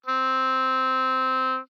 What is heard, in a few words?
Oboe ff C4